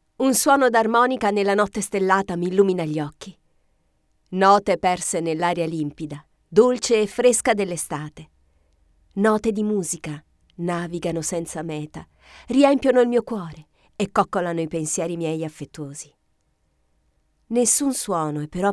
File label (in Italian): armonica poesia